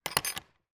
industrial gate latch metal move lock

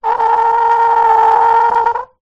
plastic object small slide scrape squeak; foley

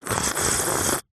human drink tea coffee sip slurp

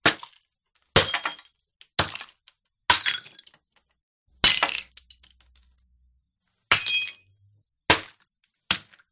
glass bottle glass break small multiple events; silverplatter audio

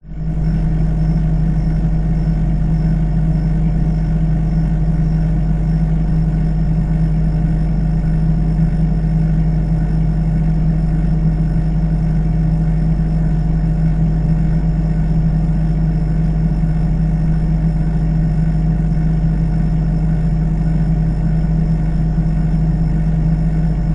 household refrigerator hum inside; zapsplat

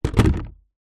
industrial plastic bucket down; zapsplat

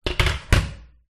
bathroom scales down hard tiled floor

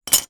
tile broken piece down on others; foley

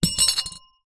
bell small reception desk bell drop carpet

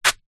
foley footstep single sandal concrete light dirt; zapsplat

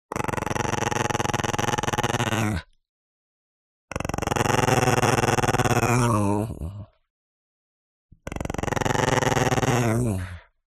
DOG Chihuahua Growl Creak; ANIMAL; smartsound

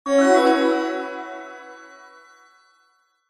multimedia game sound swell action magical

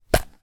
sweets chocolate shells tube lid remove; smarties

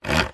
studio controller rotary knob turn; technology; zapsplat

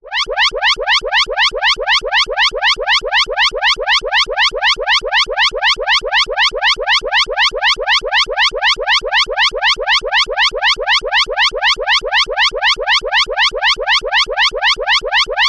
emergency siren alarm serious harsh

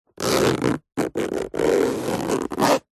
foley case small hard shell zipper slow; zapsplat

